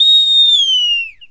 WHSTLWD DO, Synclavier Percussion World & Orchestral; ILIO; Synclavier Percussion 2